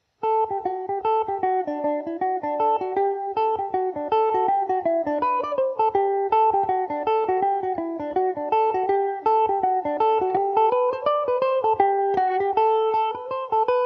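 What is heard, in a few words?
green pigeon G major, irish mandolin; Epiphone Mandobird VIII electric mandolin; The Green Pigeon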